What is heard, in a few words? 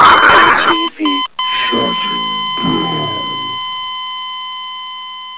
death; sven; zombie hev